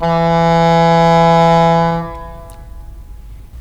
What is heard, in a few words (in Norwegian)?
enghorn